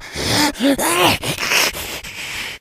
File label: amb; cstrike